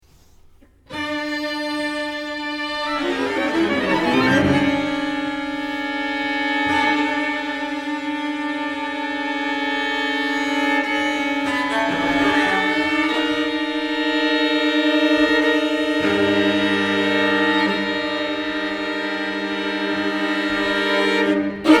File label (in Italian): Quartetto III; Gentile - Quartet III for strings; Festival Montalcino 2008